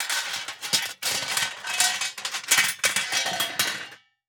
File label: Pots And Pans Bang Around, Household